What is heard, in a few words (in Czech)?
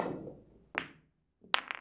kulec; Mafie 1